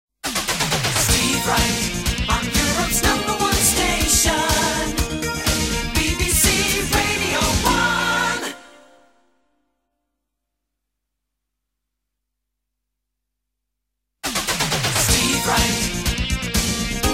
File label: jingles; steve wright; europe's no 1 station bbc radio 1 1990